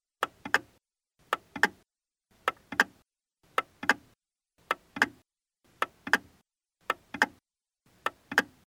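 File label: sound of a pendulum backwards; Звуки обратного отсчета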